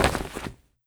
cloth; Rust

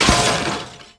metal lamppost down; World of Tanks Blitz

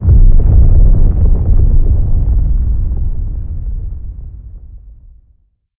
building rumble

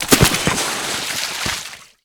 sewer slide; Modern Warfare

